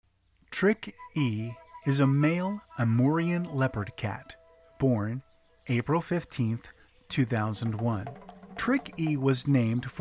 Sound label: Leopard Cat; TrickE